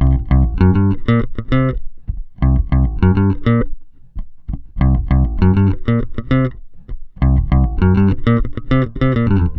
COOL JZ 100, Bass Grooves; USB Soundscan vol.30; AKAI

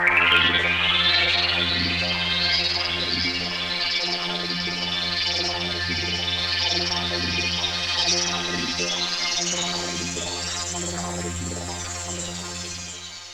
DRONE F, SYNTH FX; AMG; Global Trance Mission VOL-2

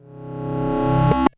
Reverse FX; Zero-G Ambient 1

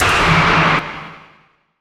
NOISE, Production Effects

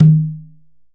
Tumba Open; Digital Sound Factory

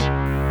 synth bass, Prophet 5; Greytsounds VOL-1

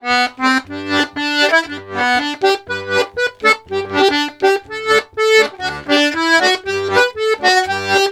POLKA, Accordions; USB Soundscan vol.40; AKAI

